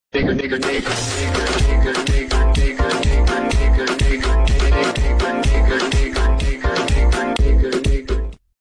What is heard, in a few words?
funk